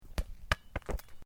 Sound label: Sport Soccer Bounces Stopped Concrete, Soccer ball sounds